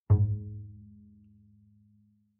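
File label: Bass pizzicato fortissimo sul A Ab2, Double Bass; MIS Pitches - 2014; Strings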